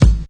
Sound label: punchy kick sound g sharp key